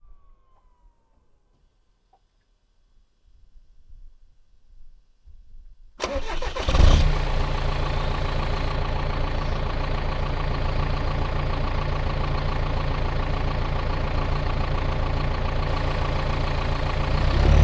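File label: John Deere tractor onboard start fast drive stop reverse stop drive stop off exhaust mix; Sonniss GDC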